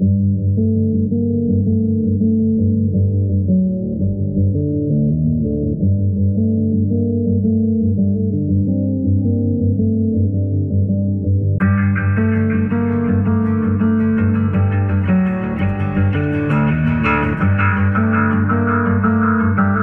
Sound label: guitar, Loops